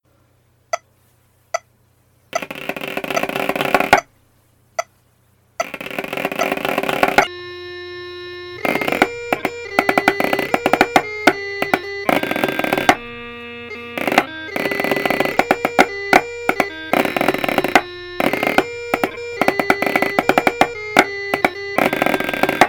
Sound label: Snare Chanter